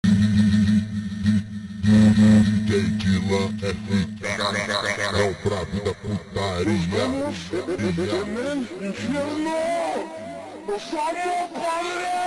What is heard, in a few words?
funk slowed reverb